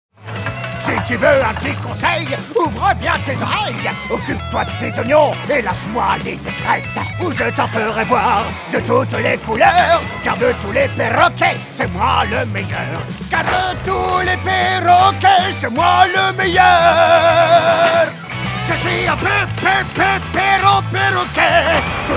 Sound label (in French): Chant Perroquet; Masha et Michka